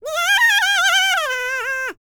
BLACK SEA, World Voices; ILIO; Vocal Planet VOL-5

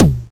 Tom, Drums; Bitkits

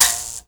MOBO HI, MOUTHBOW, Heart of Africa

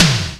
TICTALK DRUM

